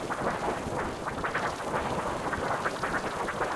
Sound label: Rewind Warble